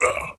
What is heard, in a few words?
Recoil; Puzzle Chronicles; PS3; PSP; X360; SFX 3D Daemon